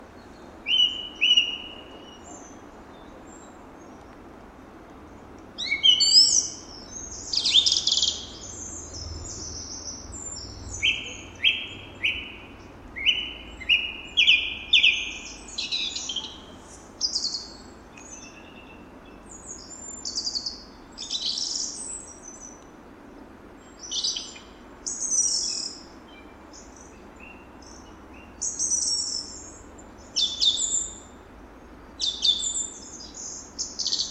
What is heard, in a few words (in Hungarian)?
enekesrigo hangja